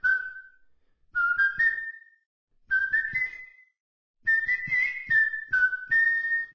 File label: Peeing add on Music